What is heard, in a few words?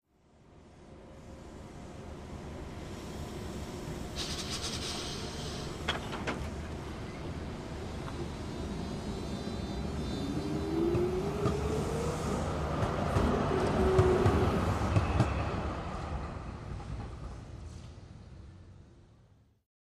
City Amb Electric Str, el trains